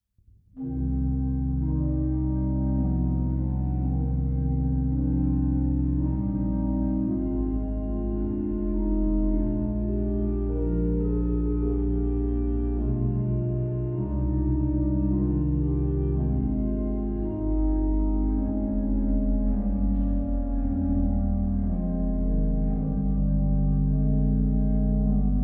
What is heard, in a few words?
prelude in c minor